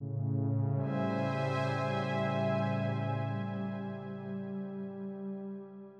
70s sci-fi style, Stings; cinematic drama; musicradar

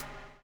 BUBL, Backbeat; Spectrasonics - Backbeat

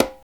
Congas, Latin; Roland L-CD701; PRC Latin 1

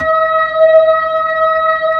B-3, Blues; Roland LCDP10 Keys of the 60s and 70s 2

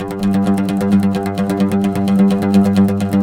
OUD G 2; Spectrasonics; Hans Zimmer Guitars VOL-2

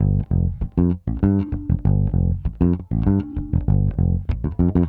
Smooth Groove Bass, 70s Analog Funk; Sonic Foundry (Sony Creative Software); What it Is!

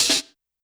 Snare Break, YAMAHA RM1X

